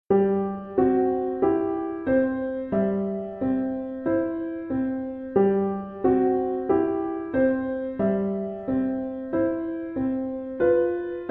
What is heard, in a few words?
piano